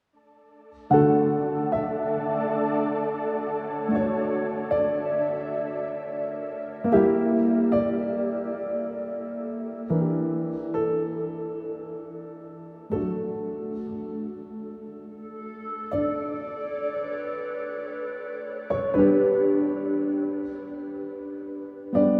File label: 80BPM; DoctorHologram